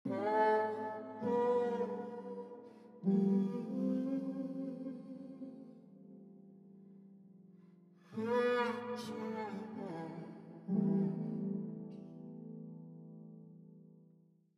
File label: Pitching